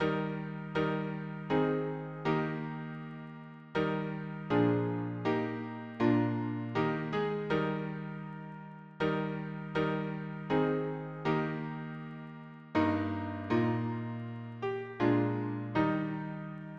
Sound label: harmony piano